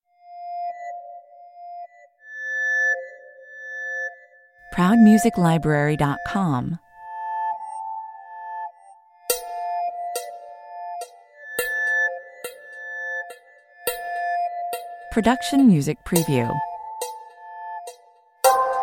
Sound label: electro ambience 105 bpm